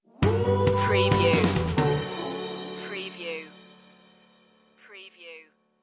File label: rainbow pop stinger